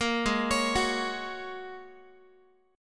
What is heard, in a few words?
Ab 7th Suspended 2nd Suspended 4th, Guitar, 4A,4E,5A,5D,5G sharp,6C sharp; Ab7sus2sus4 arpeggiated; 13,16,16,16,x,x